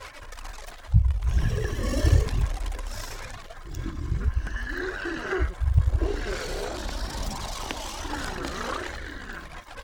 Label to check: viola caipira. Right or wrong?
Wrong